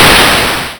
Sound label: explosion